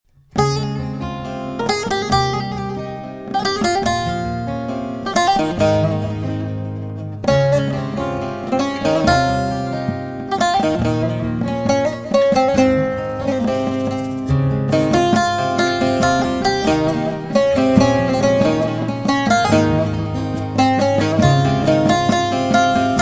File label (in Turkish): Saz